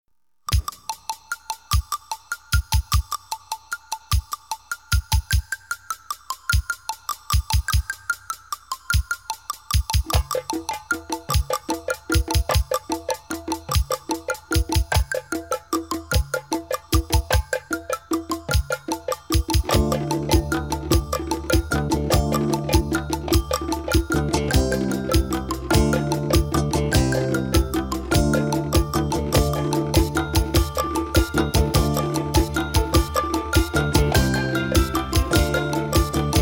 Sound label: instrumental; persian